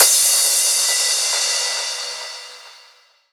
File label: Crash, Cymbals